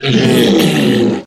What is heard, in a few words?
troll monster hurt pain long